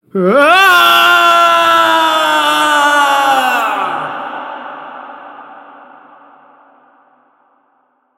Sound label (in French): Perso tombe foot bridge; Blind Survivor